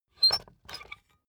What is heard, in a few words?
puncher squeak punch slow